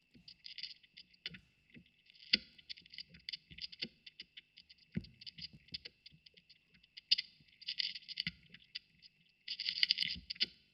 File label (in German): Schallplatengeraeusche, Rode NT4